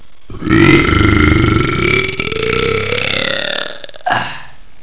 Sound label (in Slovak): Grgnutie; Revelation Sight and Sound SK